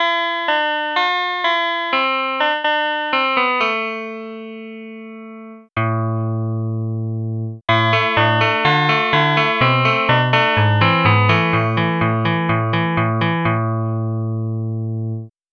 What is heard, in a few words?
jazz guitar